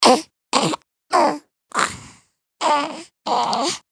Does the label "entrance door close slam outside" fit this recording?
No